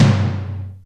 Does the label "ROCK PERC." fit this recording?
Yes